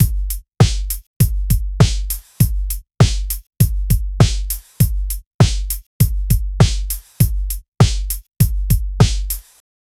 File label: drum and bass beat